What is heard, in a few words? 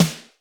ROOM S, ROOM KITS; Crypton; DrumBase5000